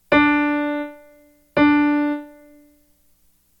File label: rhythm recognition